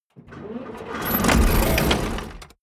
tank medium damaged

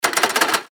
door snap

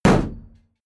shield sheet impact clank

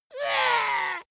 dwarf disgusted

